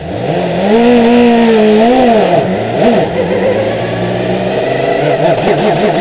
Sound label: START X